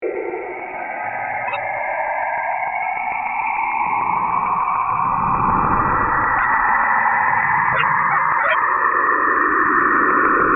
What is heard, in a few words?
spooky saturn